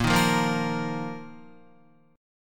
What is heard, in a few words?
Bb Suspended 4th Bb down Guitar; Bbsus4 strummed